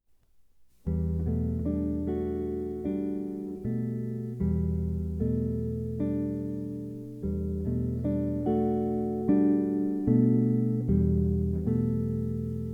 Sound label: gentle rain vocal